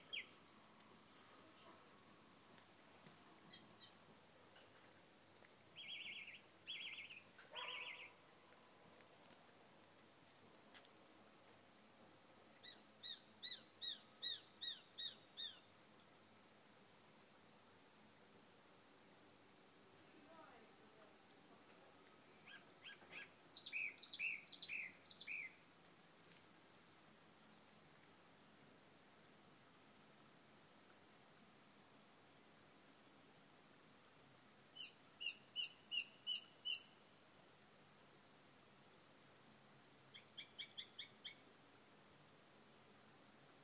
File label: evening bird acoustic